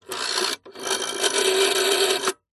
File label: household telephone old fashioned rotary style recorded underside dial no nine; zapsplat